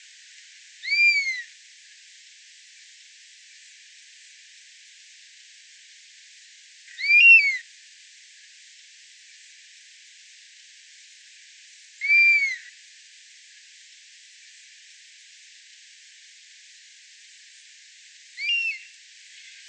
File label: Buzzard, bird songs